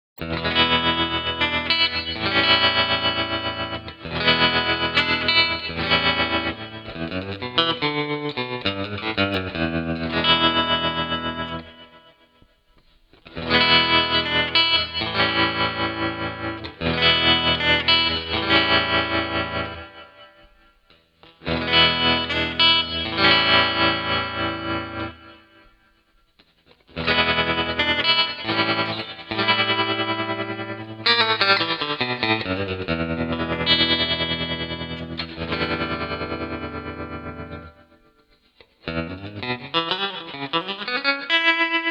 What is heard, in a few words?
Vibrato; Quilter Steelaire Pro